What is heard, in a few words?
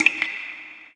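DRIPWAT, drip water; forsaken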